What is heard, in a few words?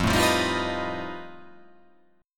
F 9th Flat 5th F down